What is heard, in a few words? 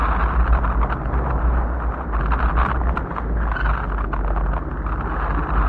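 ambient magma; hellhole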